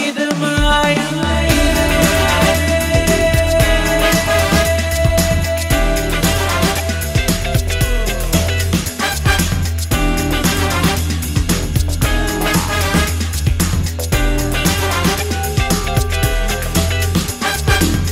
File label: tamil music ringtone